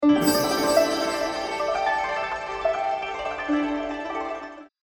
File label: mystery prize total win; Super For